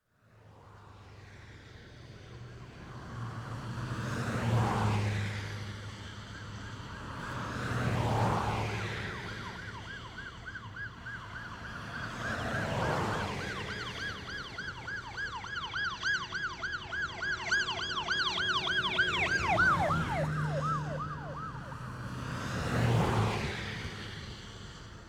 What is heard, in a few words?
Police Car, High Speed Pass R To; Emergency; BBC Sound Effects Library